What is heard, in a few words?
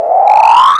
space drag; eCS 1.00; MMOS2